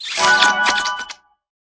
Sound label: chocolate box medium